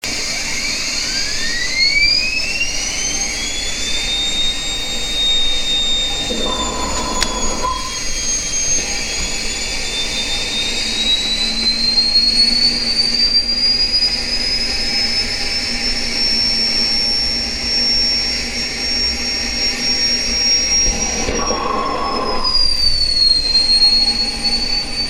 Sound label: jet